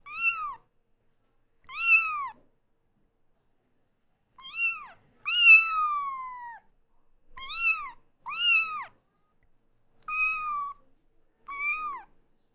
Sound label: Kitten Meows